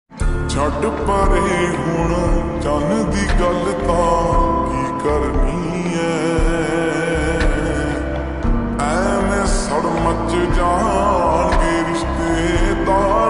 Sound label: slowed punjabi ringtone